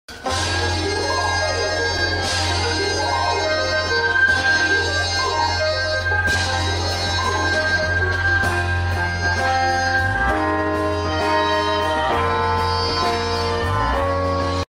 Geomungo, ساز سنتی کره‌ای

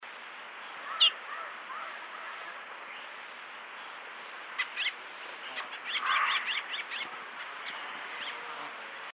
Wing barred seedeater

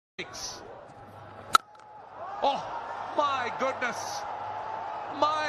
Cricket; ورزش

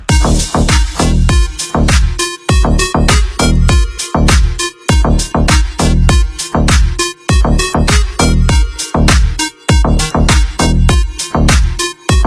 ringtone

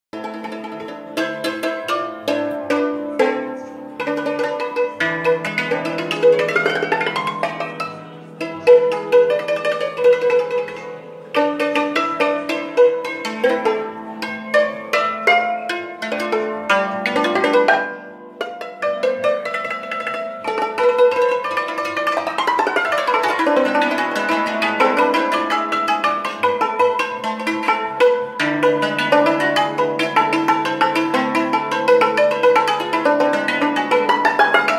Cimbalom

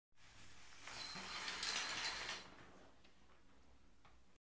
shower curtain; پرده حمام

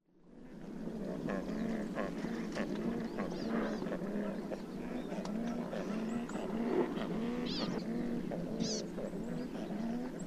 Secretarybird; مرغ دبیر

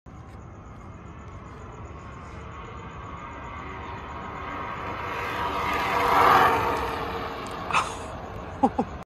electric scooter; اسکوتر برقی

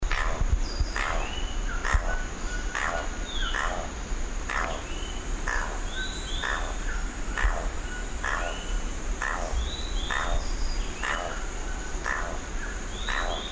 Golden collared toucanet

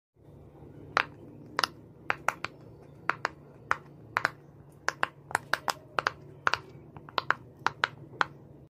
Puppet Fidget

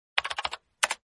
Typing Sound

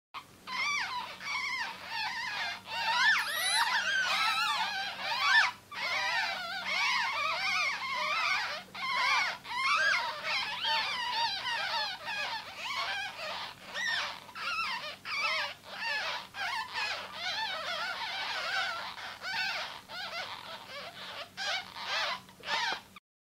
ferret

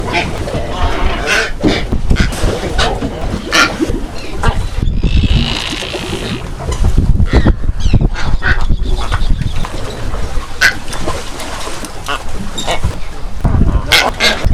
Brown booby; پرنده بوبی قهوهای

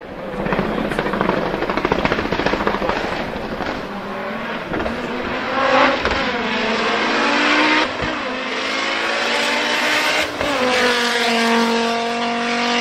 backfire

